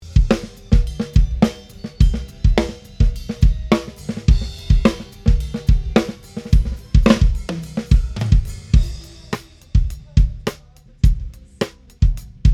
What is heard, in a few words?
kick snare eq, on passe toute de suite à la même chose avec égalisation (et un poil de compression très léère)